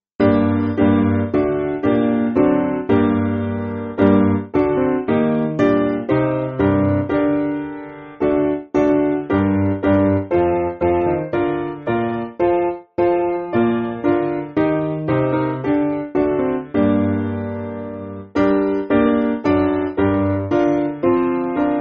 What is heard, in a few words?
Immerasurable God Hosanna Piano; Simple Piano; 2/Gm